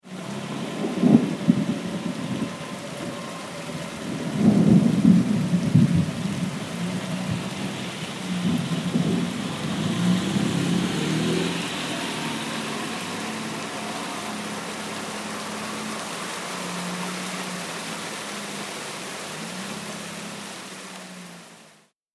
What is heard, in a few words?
Rain And Thunder Car Passes By; Outside Ambience; Andrew Fleming